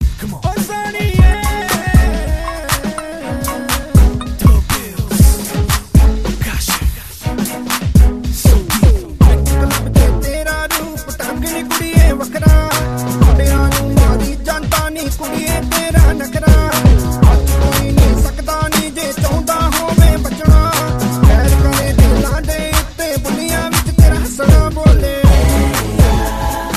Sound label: bhangra ringtone